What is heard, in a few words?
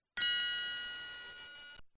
unlock; MiaoDaren